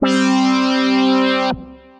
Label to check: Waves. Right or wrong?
Wrong